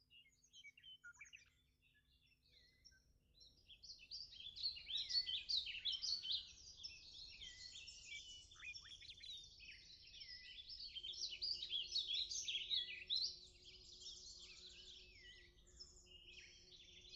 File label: Birds and Bells; Kuprevicius